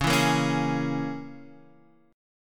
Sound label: C sharp Minor 6th C sharp, Guitar Standard; C#m6; strummed; JGuitar